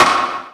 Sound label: MISC, Skanky Perc; E-MU Formula 4000 Series Vol. 1; Hip Hop Nation